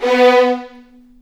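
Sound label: B3 violins; The Ultimate String Collection; East West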